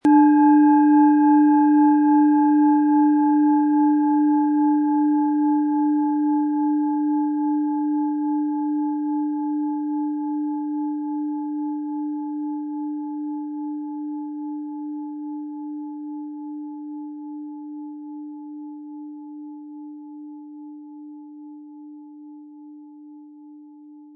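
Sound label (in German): Planetenschale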